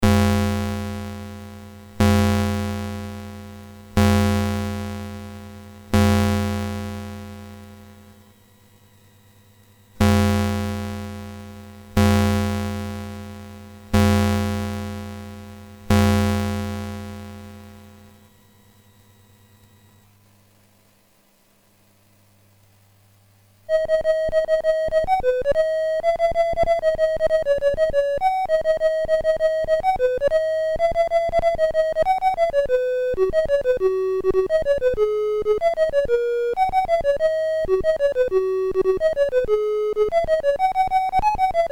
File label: Ding Dong Bells, MOS6581R4; Ding Dong Bells BASIC